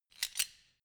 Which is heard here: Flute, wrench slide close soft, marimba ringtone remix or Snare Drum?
wrench slide close soft